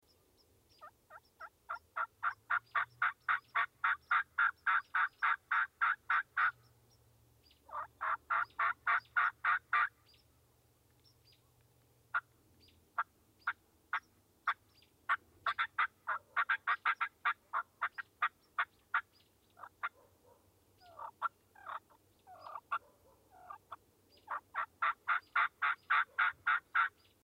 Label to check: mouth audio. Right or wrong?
Right